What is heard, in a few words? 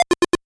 8bit se